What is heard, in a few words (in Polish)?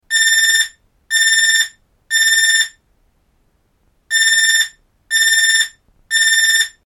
ton